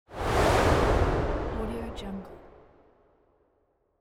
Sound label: smoke whoosh